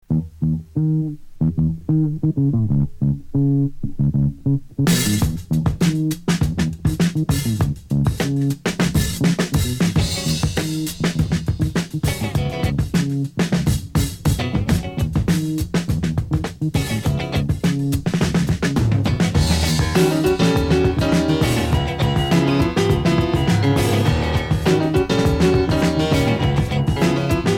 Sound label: piano explosion